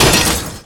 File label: metal damage; Bad Piggies